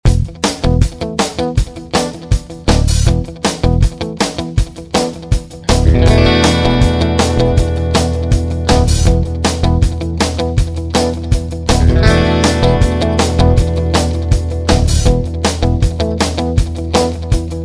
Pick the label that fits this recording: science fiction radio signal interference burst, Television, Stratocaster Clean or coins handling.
Stratocaster Clean